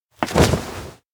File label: hug attempt; chiharu; anemoi